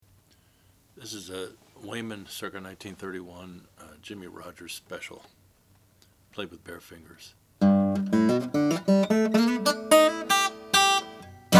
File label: weymann natural; 1931